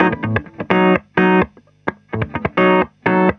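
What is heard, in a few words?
PIN guitar1, 130 bpm; USB Soundscan vol.32; Roots Disco; AKAI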